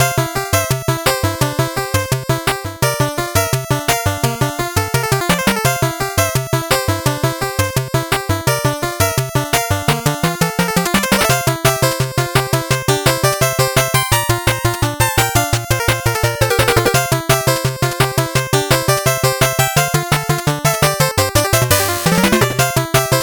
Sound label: 8bit bgm normal